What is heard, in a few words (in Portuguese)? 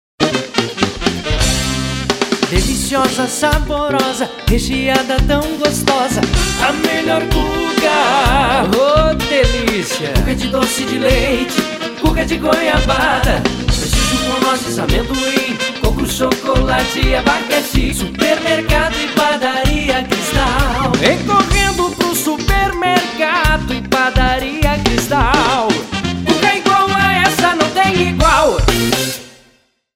Jingle Supermercado; Supermercado Cristal